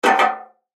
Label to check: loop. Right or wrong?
Wrong